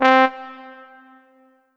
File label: Korg M3R Cornet C4